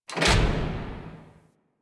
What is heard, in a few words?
Worker Lever Push; ambience; props; one offs; Warframe; Venus Open World; Venus Solaris United